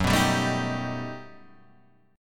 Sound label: G Augmented Major 9th